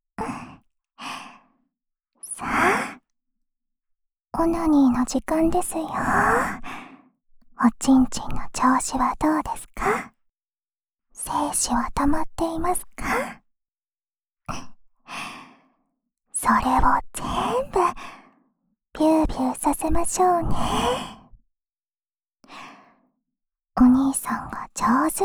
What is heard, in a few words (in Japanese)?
オナサポ用耳舐めループ（右耳, ASMR